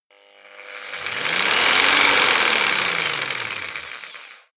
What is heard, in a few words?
jigsaw engine medium slow medium length